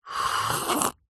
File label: human drink tea coffee sip slurp